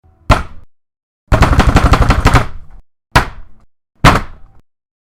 Hit the table

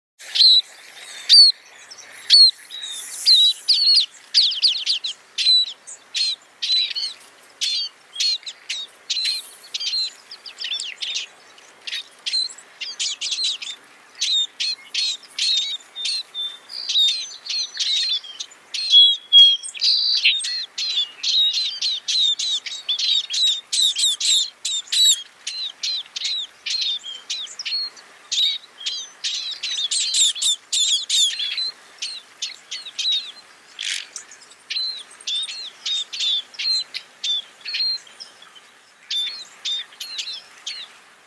scaly breasted munia sound

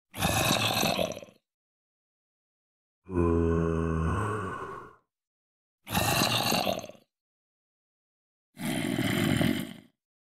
Zombie; Minecraft

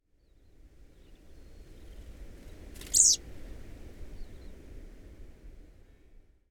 New Zealand pipit

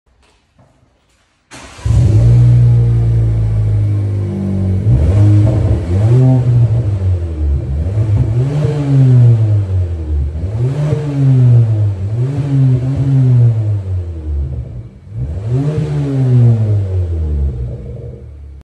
Chery Arrizo; ماشین

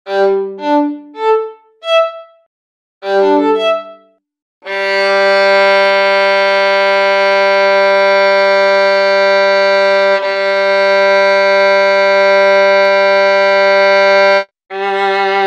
Violin notes; نت های ویولن

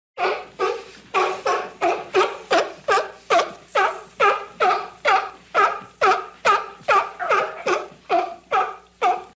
Seal Sound

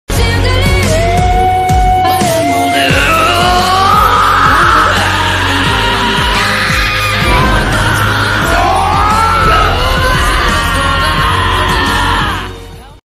Anime screams; فریاد های انیمه ای

